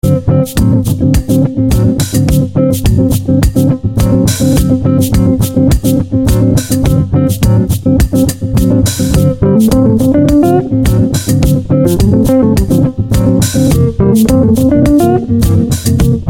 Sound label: Lounge Jazz